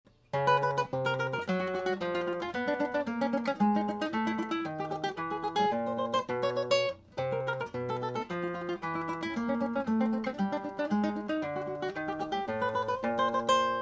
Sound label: cell descending